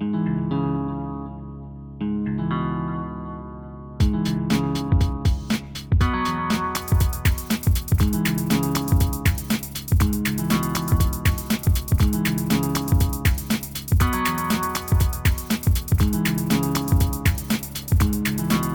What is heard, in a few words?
Rythem Flare